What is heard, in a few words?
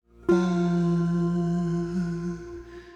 Natural Pa